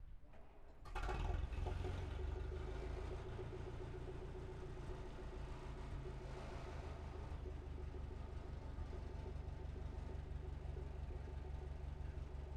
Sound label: R35 Renault Ext Fast By Exciting